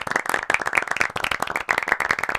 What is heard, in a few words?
clap loop